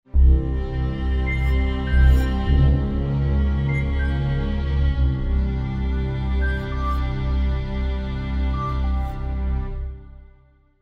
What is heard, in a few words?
tyros